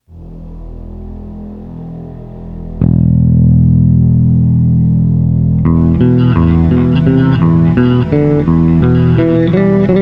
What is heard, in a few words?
bass solo